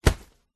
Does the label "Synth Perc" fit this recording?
No